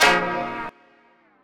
Misc Stab

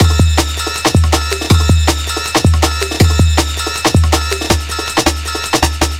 160, Drum Loops, Drum & Bass; Total Drum&Bass

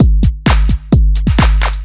130 drum loops; Basshead; M8; Electronisounds

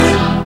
SYN, Dance Synth

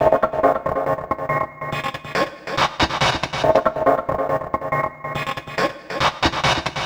Envelope Beat; Drums & Drones

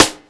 Rimshot; Equipped Music; Loungin House

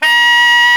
SAX T. GROW, Tenor

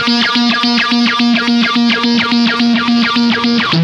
COPTER A#2, Funk Guitar; 90 s Sample CDs; Zero G

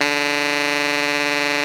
TENOR GROWL D 3, Saxophones; Greytsounds; Philip Wolfe David Crozier's Saxophones

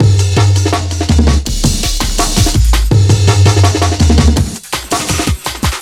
165, drum loops, drum & bass; Total Drum&Bass

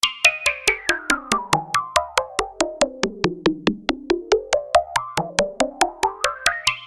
Arpeggio 140 Cm, Techno Synth Loops; Sonic Foundry (Sony Creative Software); Signals I

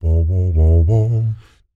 Accapella, Doo Wopper, Blues & Soul

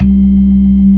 ORGAN, NO PERC

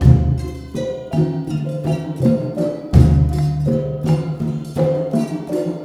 MALAY R, Heart of Asia; Spectrasonics - Heart of Asia CD2

